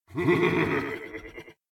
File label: laughter; Eshimal; SyrinScape; Snarl and Oraculum